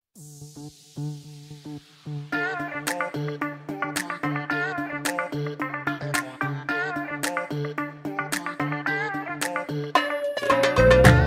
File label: Oriental